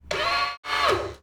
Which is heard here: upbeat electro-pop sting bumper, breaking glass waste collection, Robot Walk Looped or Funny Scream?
Robot Walk Looped